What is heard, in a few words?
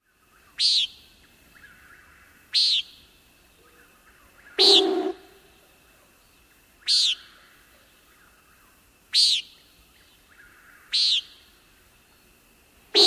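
Common Nighthawk peents and booms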